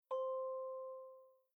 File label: Start Practice Interview Chime